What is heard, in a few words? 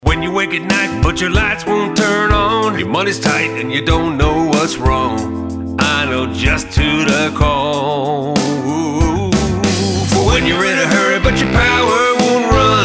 Jingle Full Sing; Express Electrical Svc